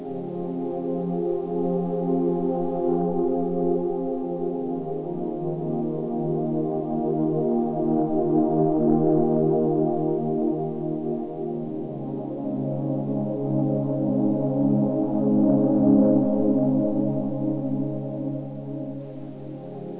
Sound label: pad riff; ゆめ2っき